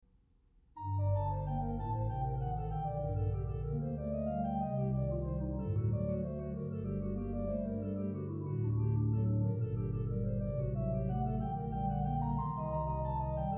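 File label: Arrangement of the hymn, organ; Skinner; Arboretum; Gabriels Message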